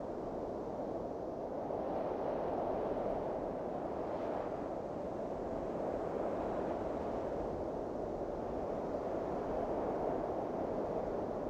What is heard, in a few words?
ambience hollow wind; Rust